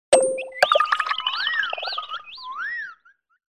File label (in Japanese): natu